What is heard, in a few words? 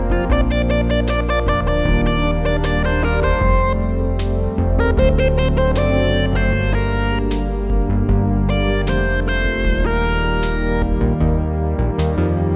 minimoog in bass